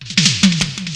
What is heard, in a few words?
JAM FILL, DRUM FILL; Crypton - DrumBase5000